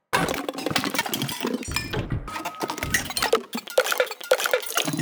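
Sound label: violin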